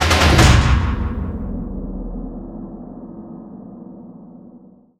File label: whoosh; stranglehold